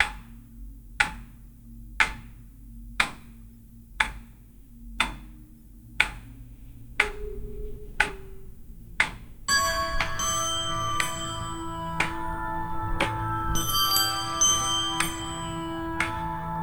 a heavenli clock tikcing

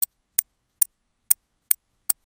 sound of coins

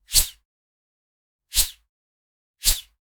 a little swoosh sound com, weaponry

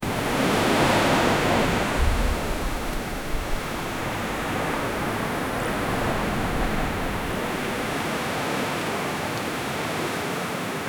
rain and ocean waves; asmr

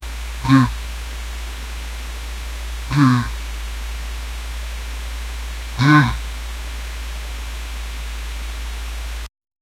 crows caw; avian

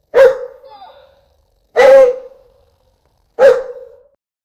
puppy barking excitedly

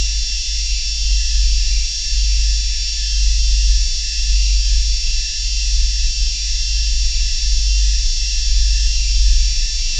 silken energy flow of, glittering

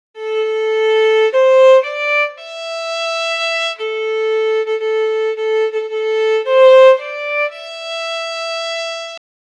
violin solo mellancholic

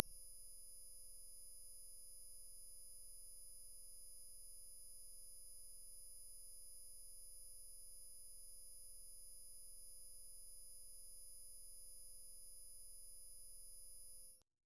buzzer sound high

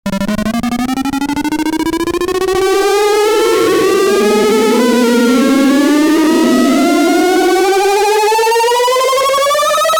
run character pixel; rpg

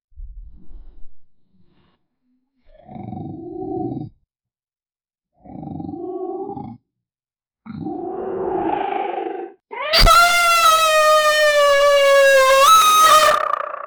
a anthropomorphic male; belly

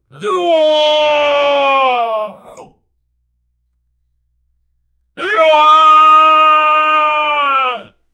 a man shouting with full, worry

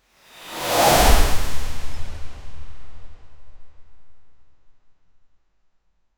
a stylish teleportation sound

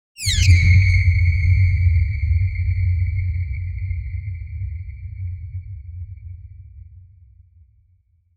a giant sci fi space port, portal

